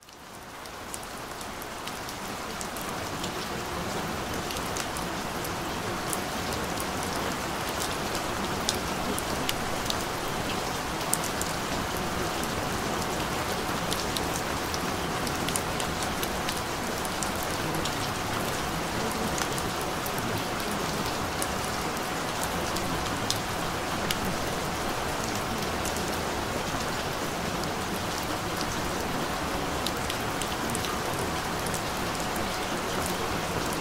741 Hz; Powerful Subliminal Mini Enhancer; Faster Results; Emotional Stability; Self-Expression

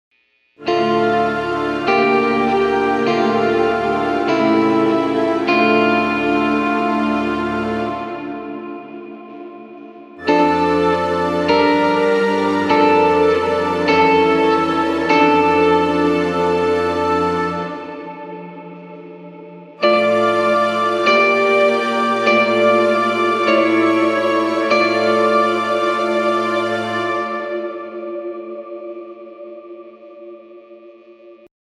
Neoclassical Primary Chords

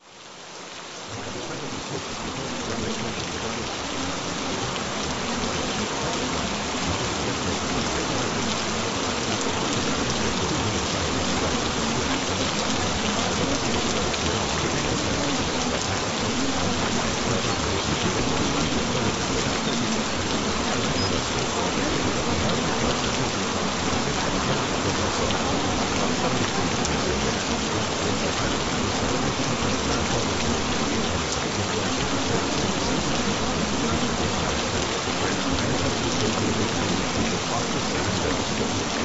gentle rain sounds; Become a Perfect Student

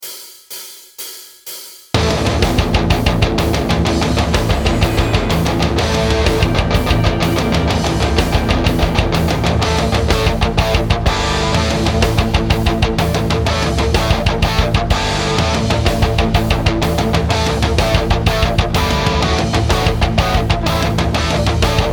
New Riffs

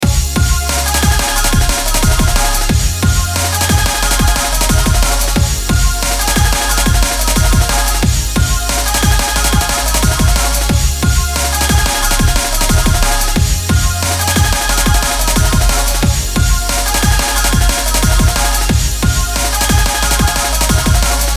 beat

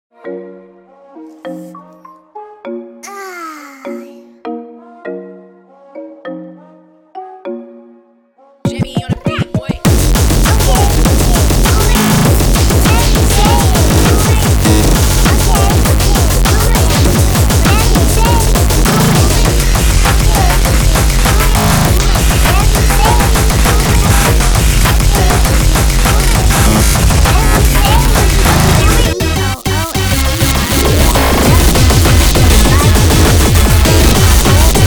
type beat NO TRANSIENTS; bernie 2020

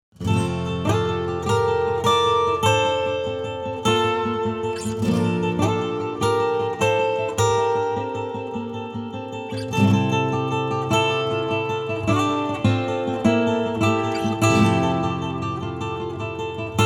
Acoustic

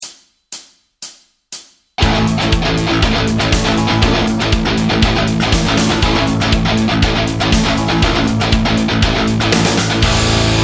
Phrygian Dominant Backing Track

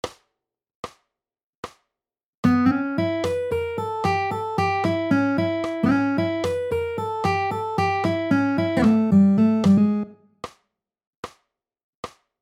Blues Lick - 75bpm

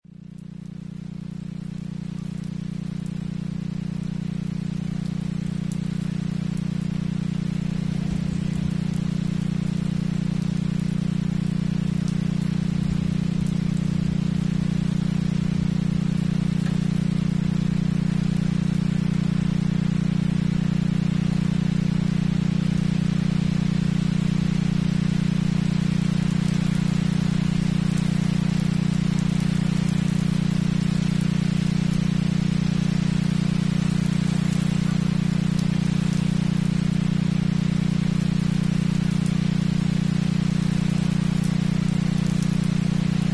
30 Hz Beta Wave Isochronic Tone Relaxing Rain; Immune System Stabilization; Detox; Relaxing Rain Sounds

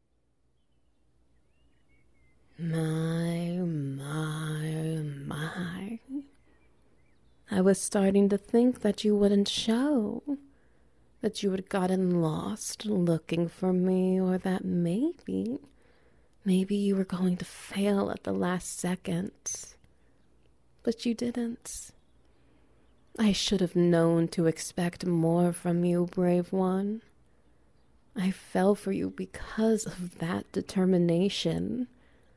Ambience SFX Wet Noises

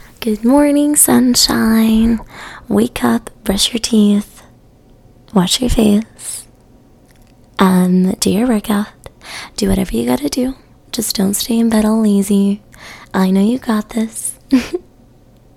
good morning ringtone

